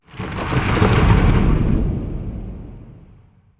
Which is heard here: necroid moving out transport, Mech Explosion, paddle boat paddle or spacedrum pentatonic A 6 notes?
necroid moving out transport